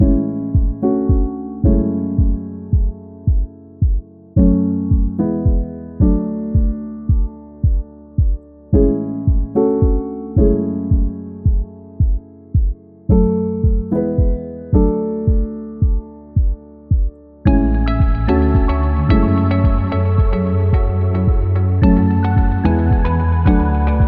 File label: Loop Corporate; conference